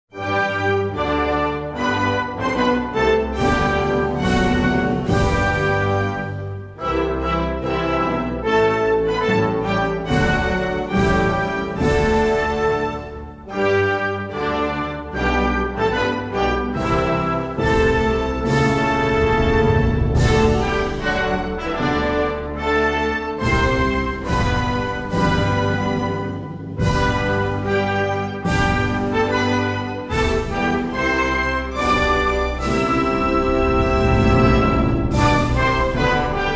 Himno Nacional de Kiribati

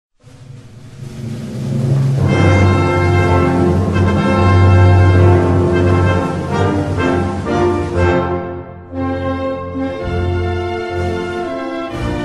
Himno nacional de Irán

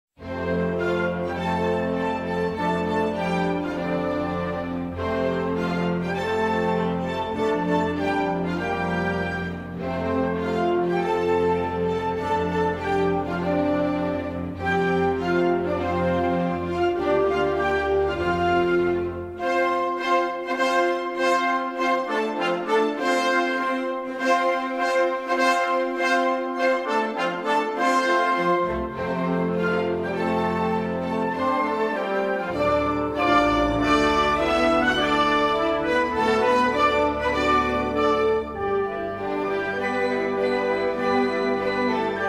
Himno Nacional de República Centroafricana

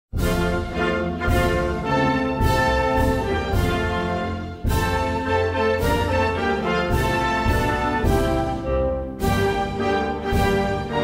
Himno Nacional de Niger

